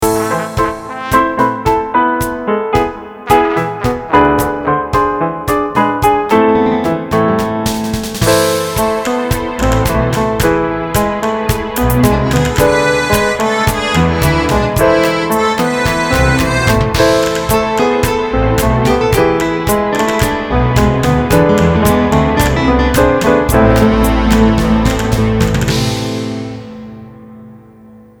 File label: tango sample